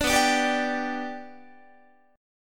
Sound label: B Minor Sharp 5th, Guitar; strummed; JGuitar